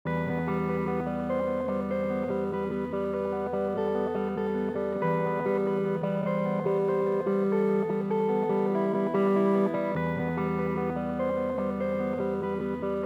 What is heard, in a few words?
dark night full melody g 97bpm